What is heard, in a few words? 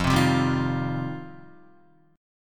F 7th Suspended 2nd Guitar, chord; F7sus2; strummed; JGuitar